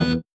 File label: ui tap; Maze-Lover